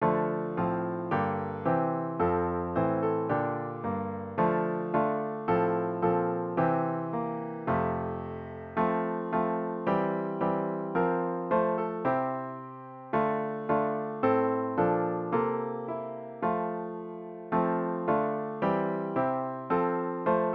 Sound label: Come O Spirit piano with Intro; worship service